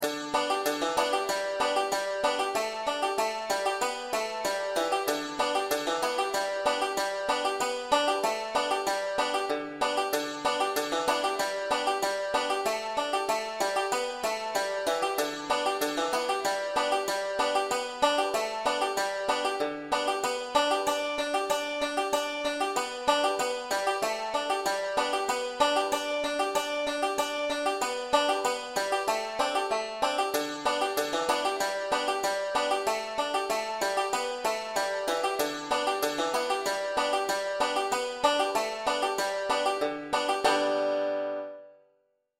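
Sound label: brethren we have met 5 string g with melody, banjo; easy tabs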